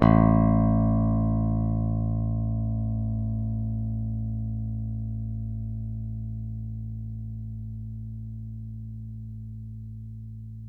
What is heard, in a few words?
hi beam pick, bass